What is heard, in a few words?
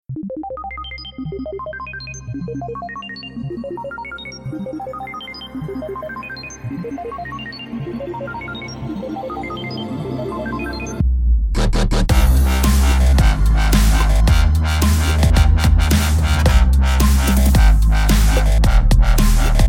MAIN MIX WITH VOCALS